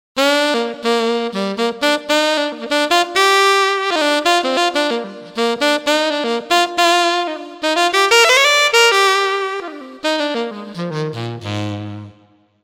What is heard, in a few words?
Tenor Sax; TURBO VL